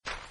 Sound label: snow punch; Games by ANGELINA; A Christmas Present